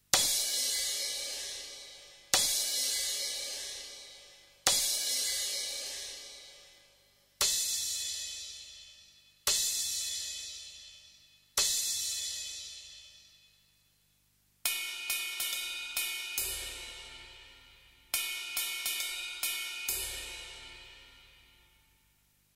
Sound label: Cymbals Comp; Golden Age Project, COMP-54